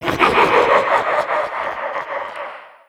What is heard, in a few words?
laugh hallow; dota; conquest